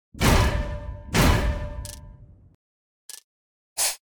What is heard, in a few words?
Boiler defense Attack